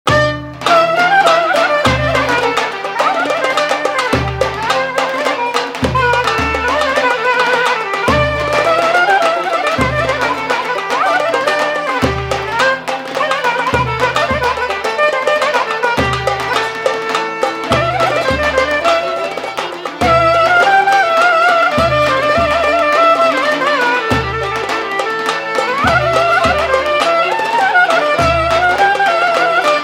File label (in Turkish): Klarnet zil sesi